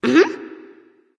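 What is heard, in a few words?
nonverbal agree; smite; ratatoskr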